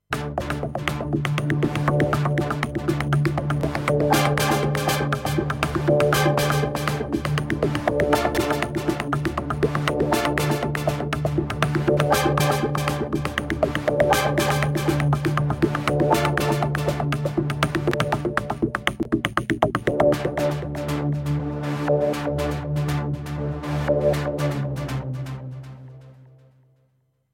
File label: Filter and Funky